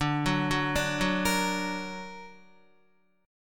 D Augmented D down Guitar; D+ arpeggiated; JGuitar

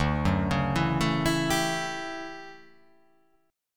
D Major 11th D down

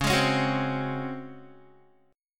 Db Major 7th Suspended 4th Db down Guitar; strummed

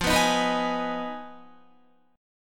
F Minor 7th Flat 5th F down Guitar; Fm7b5; strummed

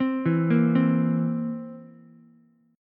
Bb Suspended 2nd Flat 5th Bb down Ukulele; arpeggiated

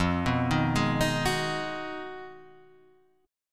B 6th F, guitar chord; B6/F Chord {1 2 1 1 4 2} arpeggiated

